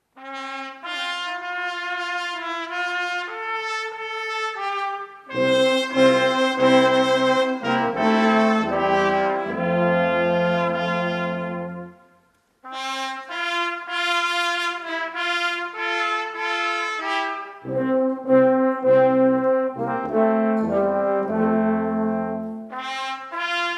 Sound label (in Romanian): Fanfara